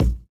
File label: kick